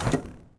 radar servo stop; machines mechanical

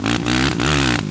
sput, Engine

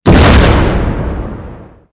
boom, frag grenade; weapons; Soldier Of Fortune II Double Helix; XBOX

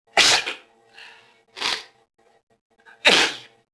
mobster noise; enemy; Max Payne

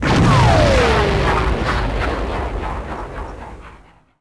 explo; starfire; Star Wars Obi Wan; XBOX

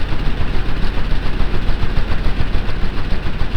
chug chug, ambience; hongkong; Soldier Of Fortune II Double Helix; XBOX

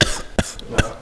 cough; Max Payne; hotel

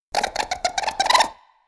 Racoon Vocal; Animals; XBOX; BassProShopsTrophyHunter2007

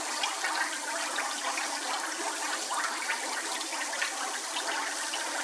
acid room; Star Wars Knights Of The Old Republic I; XBOX

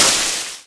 eco soft, Impact; Cars; XBOX

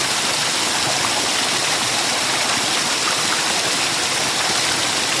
Water Fall Light Loop; Environment

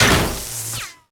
electric panel, bullet hit; projectiles; Max Payne

